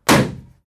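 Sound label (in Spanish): explosion pequeña; ruidos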